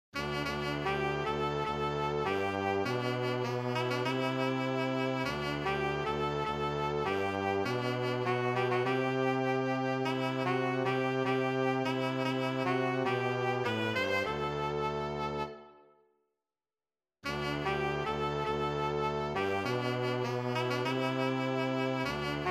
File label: Sax e Trombone